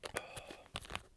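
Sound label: tetrapak with cap close; Escape from Tarkov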